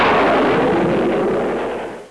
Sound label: Heavy missile launch; A-10 Attack! DEMO